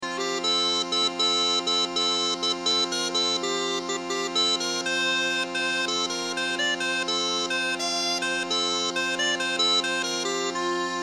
Northumbrian Piper, 'Rowantree Hill' and 'The Oyster Girl'; Ashington folk club